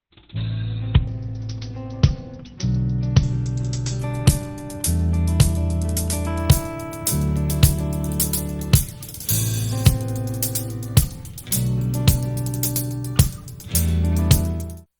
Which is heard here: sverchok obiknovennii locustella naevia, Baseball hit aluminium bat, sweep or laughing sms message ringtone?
sweep